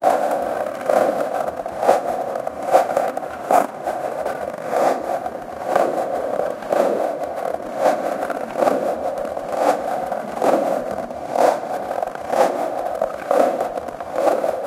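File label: skateboard ride on asphalt; constant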